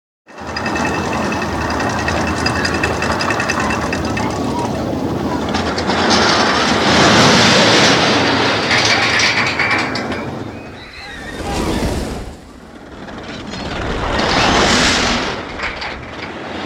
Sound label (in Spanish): Sonidos De Montana Rusa; Colosal Parque de Diversiones – EBV 2013; Escuela Bíblica de Vacaciones